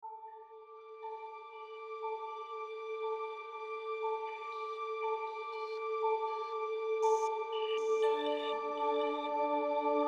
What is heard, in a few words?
ambiance arctic exploration